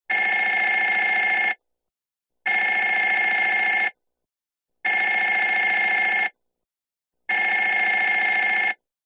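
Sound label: Telephone 4 Rings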